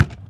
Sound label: Ticket Counter Perc; Houston; Moody Park